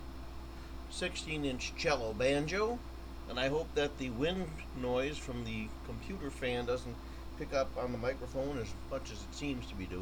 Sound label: cello